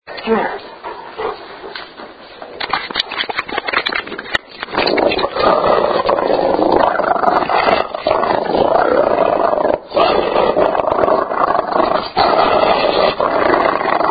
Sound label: purrs; fergus